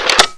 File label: MP5K clip out; weapons; COD6